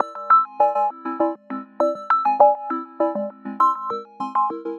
synth 100 melohyp C Maj; Transmission-X

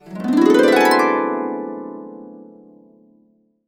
D, Harps; USB Soundscan vol.62; Complete Harps; AKAI

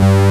DUAL BASS, JV 1080; Pro-Rec - Synthsonic

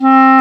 WND CLARIN, Clarinets; Clar Bryt

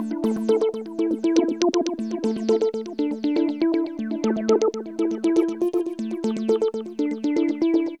trancy sequence A 120; Trance Formation; Sequences

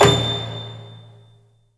A#3, JAPANI PERC; Northstar; The Wizard VOL-1

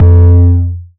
Bass F, Bass Synth

